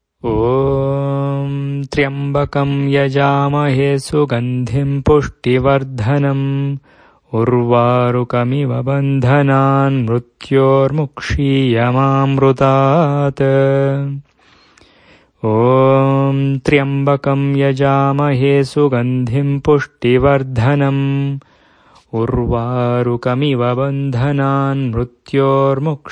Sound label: Mahamrutyunjaya mantra